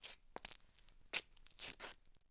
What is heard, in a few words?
Footsteps; Civ4 warlords